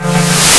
nuke; Empire Master 2.6 Demo

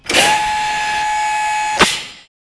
l doors open; UT

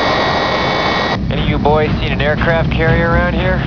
Planes Exit Windows; topgun

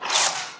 weapon whiz by, zings; Spearhead Demo; MacFormat 2003 June

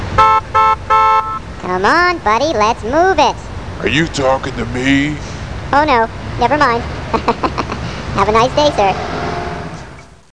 traffic

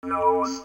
nose; Home Gene Splicing Kit

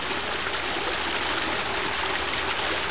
rain water; The Sum of All Fears

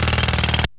jackhammer; wob2 demo

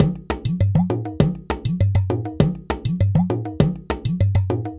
Ensemble, latin percussion; Sonic Foundry

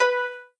play lyre

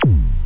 new mega zap; alienbreed3d2

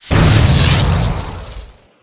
Phoenix Power Fire, Weapons; Heretic II Demo

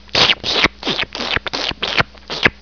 FLY EAT; Kids' CD-ROM Bible Fun Pack; Education Publishing Concepts; NOAHDEMO

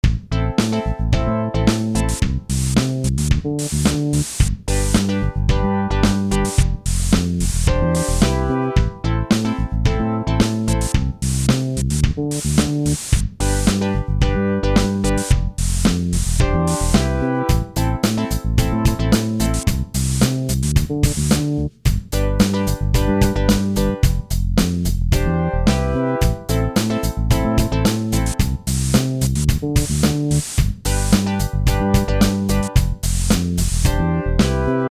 MUSIK; MOBICLIC 59

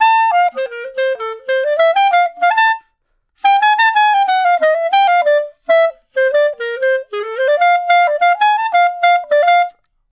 Clarinet Solo

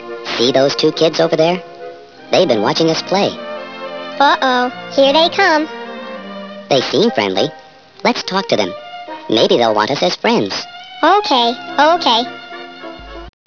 gerbile; Hobby Corner: Nature Hobbies

